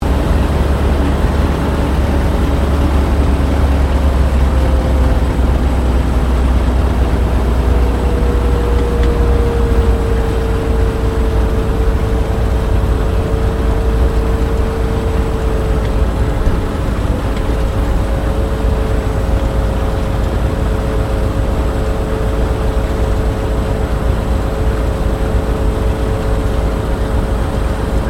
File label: AIRCRAFT HAWKER SEAFURY IDLE INTERIOR, Hawker Seafury fighter-bomber; Airplane; Vehicles; Airplanes; Aircraft Collection; Twistedtracks